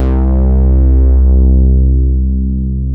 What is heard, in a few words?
MODULAR C3, MODULARBS